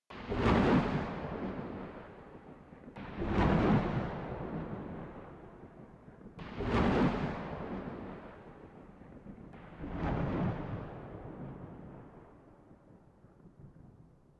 Nightmare-Thunder; super mombo quest

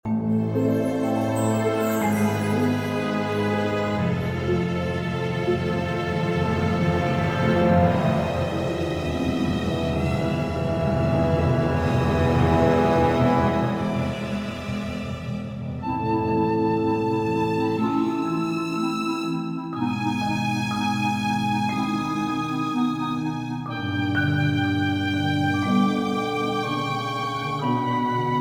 Catacombs Bg Scared

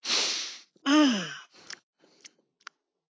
Reaction sighs

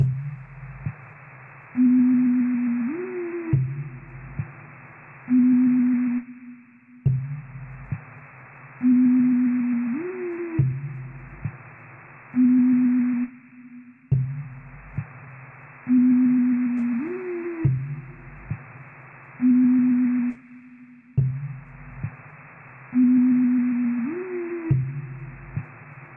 Lead+ Bass; gonner; Deathworld

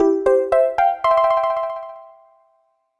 Important Item Discovered; Slime Mori Mori Dragon Quest 3 Daikaizoku to Shippo Dan; 3DS

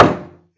Toilet door

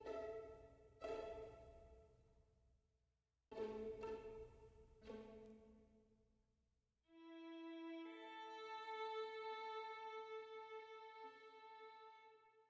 ambiant; Quivr; PS4 Windows gamerip 2016

